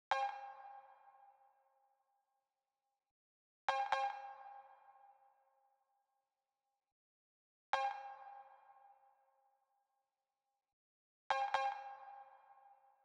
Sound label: 126 Easy Perc